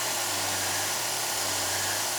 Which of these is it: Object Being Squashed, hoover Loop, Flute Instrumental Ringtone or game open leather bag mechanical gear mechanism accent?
hoover Loop